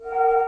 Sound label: ghost cube move